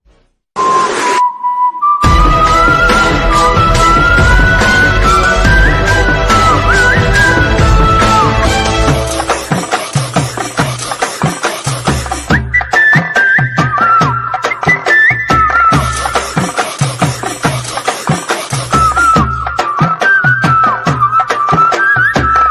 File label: tamil mass bgm ringtone; kathakali; miracle ringtone world